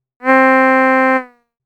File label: Nasal, synthlead